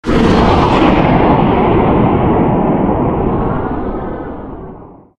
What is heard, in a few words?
male scream, halloween; Source Engine; tf